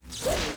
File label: Message Received; Stargate